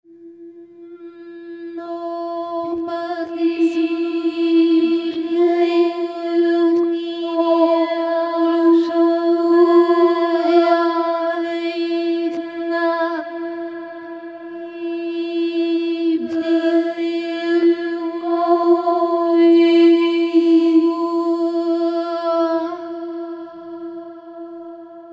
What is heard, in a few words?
girl sings myst